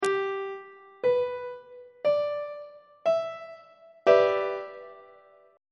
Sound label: f flat minor 7th 1st inversion on treble clef